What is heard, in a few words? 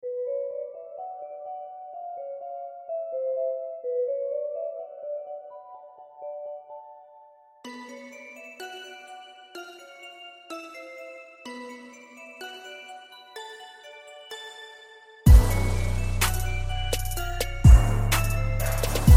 christmas trap